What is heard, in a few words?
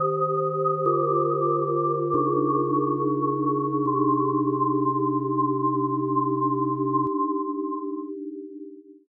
loss; final score; ld58 collector; scenes for main menu and final score, and misc sfx